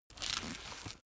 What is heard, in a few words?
boxing gloves close velcro